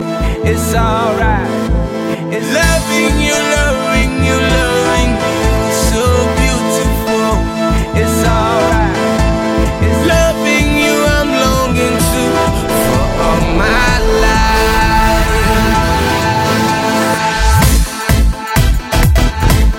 House Mix

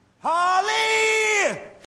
yell; Harley

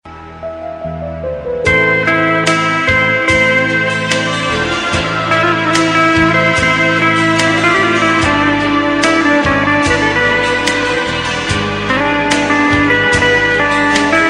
Old Song

